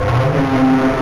OCT GLIDE; Soundengine - CSR-000 The Art of Transwaves

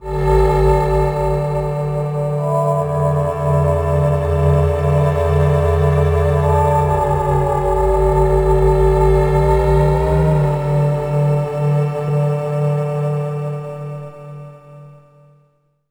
Residual Synth; Sonic Foundry (Sony Creative Software); Loop Noir

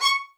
C# 2, soft violins; Q Up Arts; Denny Jaeger Master Violin Library

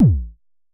Unce Unce Kick A1, Vermona Kick Lancet; LookIMadeAThing Kicks

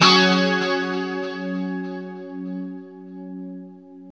2CHD F#4, Guitars; Spectrasonics; Hans Zimmer Guitars VOL-1; JC-SHEEN

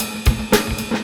Sloed gruve, Synchro Funk, Beats; Sonic Foundry (Sony Creative Software)

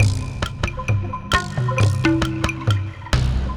Percussion Combos; M-Audio; Pro Sessions VOL-38; Abstract World Fusion 2

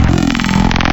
Dirty Throat, wavetables, Analog; Massive 3-Analog-Electric

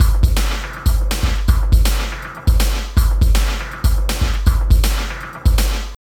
SUBWAY SHU 162, LOOPS

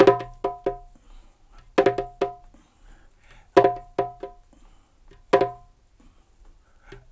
135 BPM, perc loops, dub

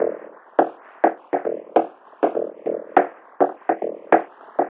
Drum Fx Loop, Electronica

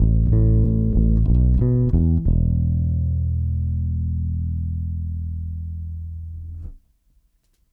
bass, analog funk; Mixed Berry Jam; Sonic Foundry (Sony Creative Software) - What it Is! 70s Analog Funk

